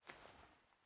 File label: Take Hands; MiSide